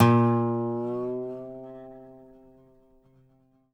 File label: DOBRO UP